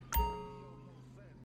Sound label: notification